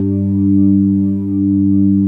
B3 HAM, Keyboards Old School; USB Soundscan vol.09; AKAI

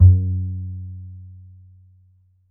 E, Upright Acoustic Bass; Larry Seyer; SWITCHABLE POSITIONS

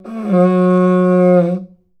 IVORY HORN, AFRICAN PERC; AKAI S6000 CD-ROM - WorldSounds

